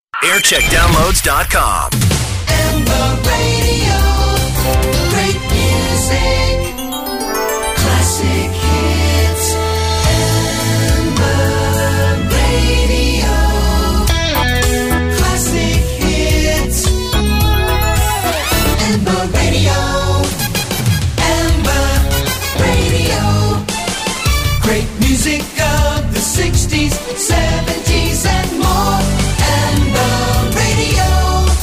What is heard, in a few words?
Jingle Montage; Amber Radio; TCP; 1990s